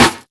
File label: treble heavy acoustic snare sound b key; snare drum samples